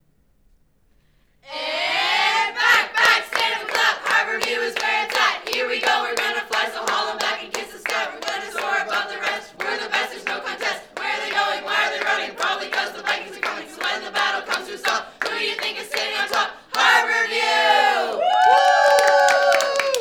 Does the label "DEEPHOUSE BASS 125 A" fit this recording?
No